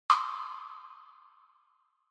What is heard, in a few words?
Woodblock Mid